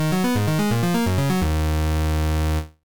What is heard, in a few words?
Level Incomplete; Crystal Mines; NDS gamerip